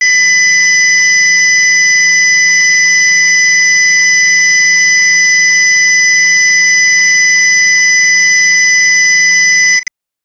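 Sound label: japanese emergency earthquake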